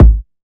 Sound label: Kick